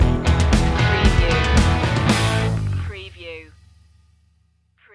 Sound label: cruisin stinger